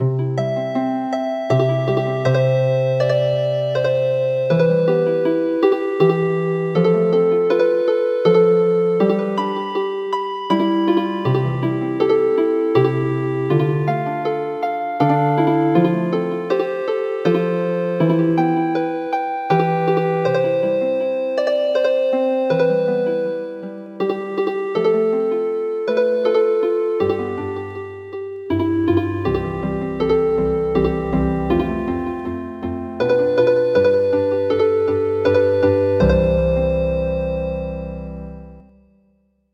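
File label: Healing Harp, midi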